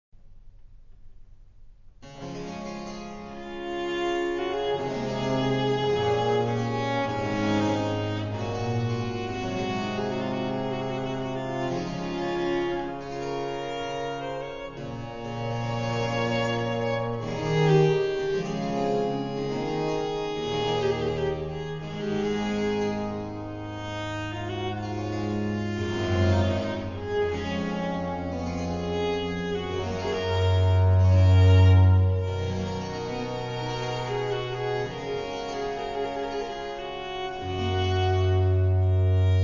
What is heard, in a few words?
D Prelude; Marais